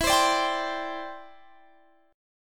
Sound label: E Minor 7th Sharp 5th